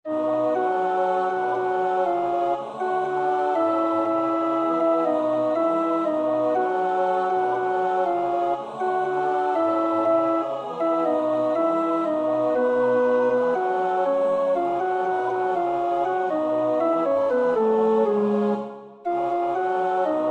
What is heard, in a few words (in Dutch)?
alt, koorpartij oefening; Willaert (Adrian)